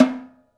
Snare, Wires Off; Snares

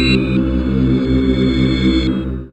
Dance Mega Synth; Best Service; 90 s Sample CDs

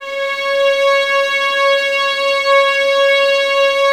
violins C#5, string ensembles; Miroslav Vitous; 11 Violins

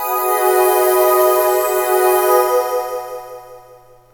PAD, Hip Hop; E-MU Formula 4000 Series Vol. 1; Hip Hop Nation

